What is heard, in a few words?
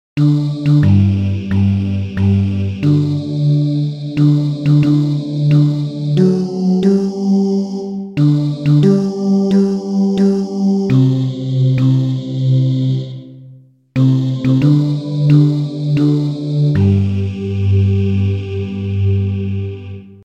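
Bass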